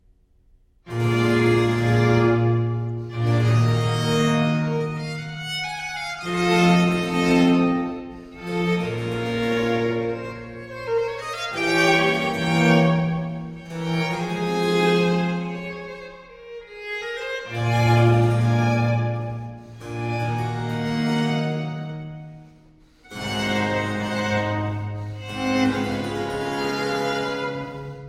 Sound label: Adago Concerto In G Major For Strings; Antonio Vivaldi; RV 151 (Alla Rustica)